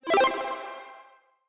Objective Completed; pac man hats 2 android ios mobile gamerip 2017

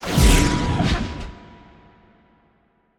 Field Mat Tap; Yu-Gi-Oh! Master Duel

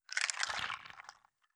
big fly stomache problems; Hollow Knight

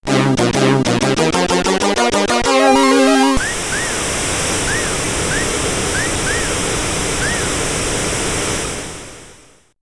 Victory, SID Stereo; Aspar GP Master; Commodore 64

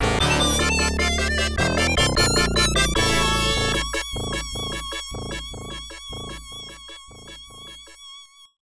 Scene Clear; Rastan; arcade

